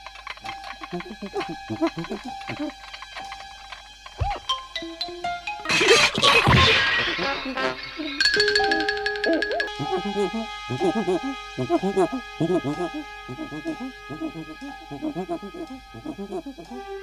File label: bubbles; dragon ball z movie 8